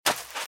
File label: Footsteps Sand Dry Run Left; RadwinIsland